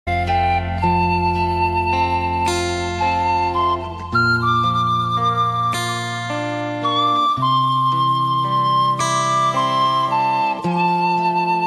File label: suling sedih ringtone